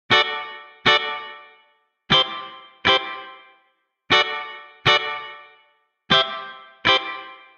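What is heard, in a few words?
Guitar Retro D major 120 bpm; Guitar and Bass; Ableton; Factory Packs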